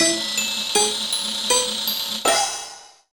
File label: Jingle Game Start; Disney Fairies Tinker Bell and the Lost Treasure; Nintendo DS